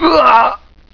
grunt; Gloom3 rejects

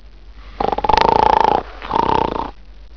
SNORE

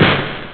bang

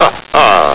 HA HA

